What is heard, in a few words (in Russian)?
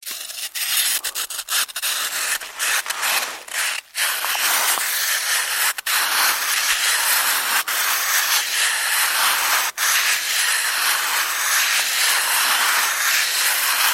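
снег трение и соскабливание снега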